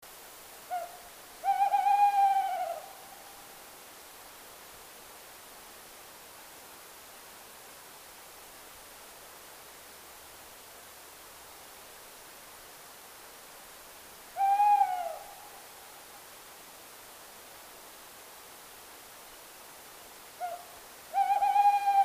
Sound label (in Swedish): Kattuggla